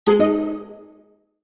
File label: correct answer